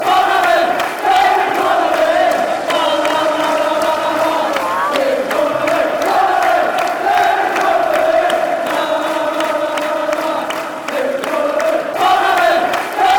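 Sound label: fanchants, football chants; david connolly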